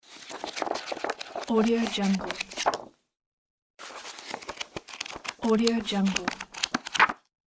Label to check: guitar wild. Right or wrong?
Wrong